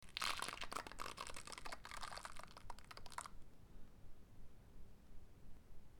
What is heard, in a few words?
crackling